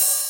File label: percs